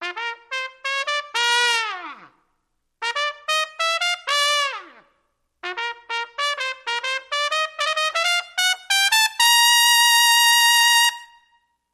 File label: Trumpet Pep Rally